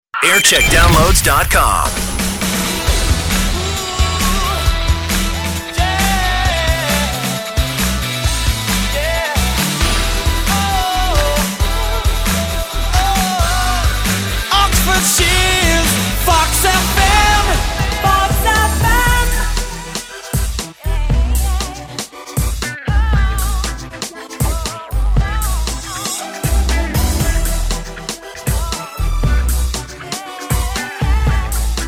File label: jingle montage; fox fm